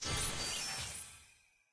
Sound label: xp bar xp gain; tft